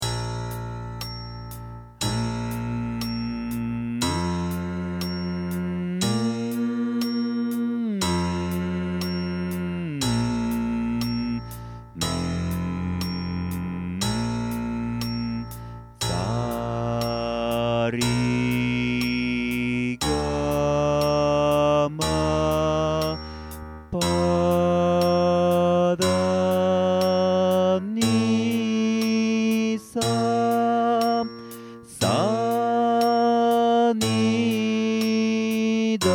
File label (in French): gamme; Ganesh